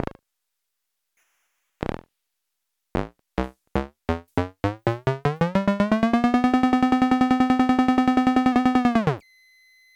kosmic shade banjo pitch

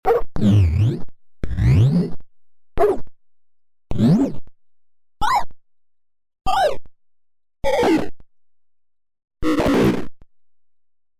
dog bird; morphotron

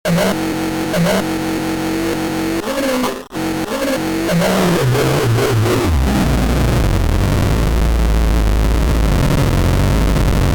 rumble barn buttons

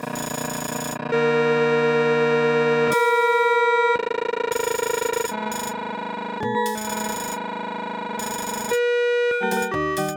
traffic noises

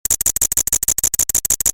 optidog button

